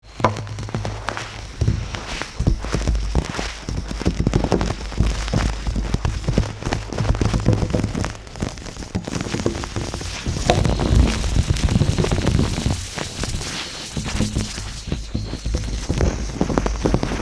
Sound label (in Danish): Lysedug, dramatisk